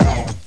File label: multi hit, rap